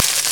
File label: fire; Amiga Soundtracker Sample Packs